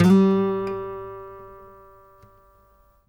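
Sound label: trill, Guitar; Quantum Leap; Guitar & Bass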